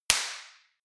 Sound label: party popper; explosionen